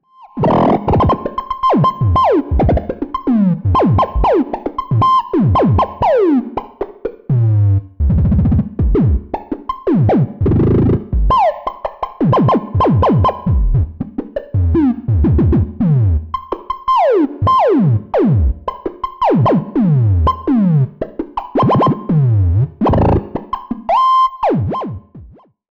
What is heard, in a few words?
Generative Patch